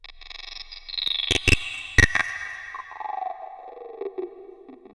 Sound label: MULE TRAIN; ENVIRN-AMB